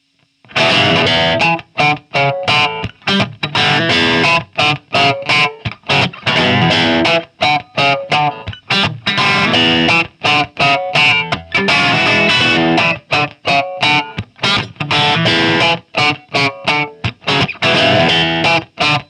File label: Single Coil Passiv Bridge Crunch